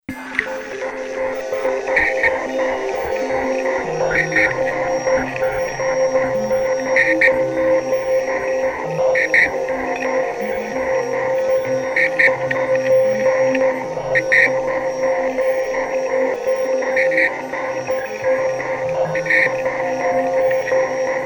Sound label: Sonar Dance